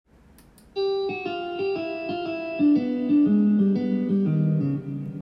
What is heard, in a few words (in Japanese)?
jazz fure zu